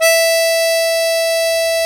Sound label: HARMONICA